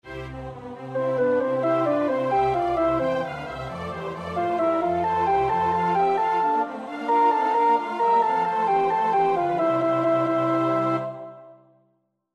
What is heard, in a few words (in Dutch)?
alt, koorpartij oefening; Purcell (Henry); Dido and Aeneas